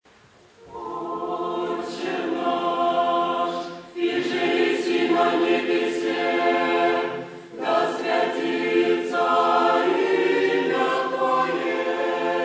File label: choir